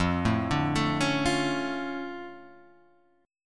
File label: F Augmented 6th, guitar chord; F+6 Chord {1 0 0 2 2 1} arpeggiated; 1,3,sharp5,6